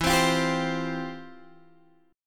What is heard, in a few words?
F Major 7th Suspended 2nd